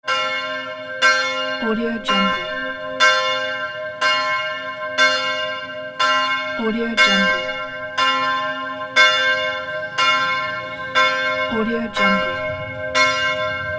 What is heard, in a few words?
church bell